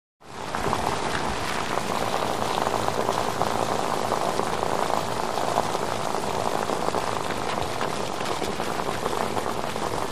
Tire Through Puddles. Roll To Stop Car Start Close, And Roll Again. Slow Down Then Roll Again, With Water Drops On Slow; wet traffic